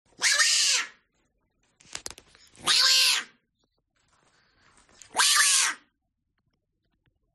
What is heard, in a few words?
hyrax scream